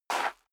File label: step high heels gravel light slide short; schritte; Footsteps; menschen